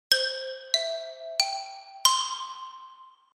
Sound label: xylophone